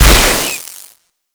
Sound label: Purification Weakpoint Destroy D; Infested Survival